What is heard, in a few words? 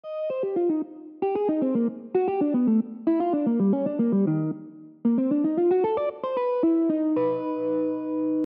motif